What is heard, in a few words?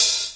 HH OPEN, Big and Heavy Real Drum Kit; REAL LIVE KITS